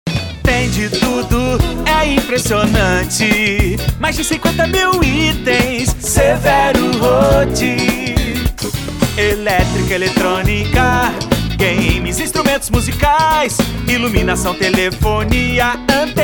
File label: Jingle; Severo Roth